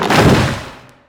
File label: tree grab; tiny